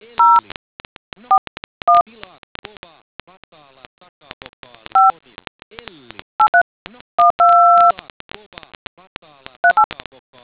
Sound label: dtmf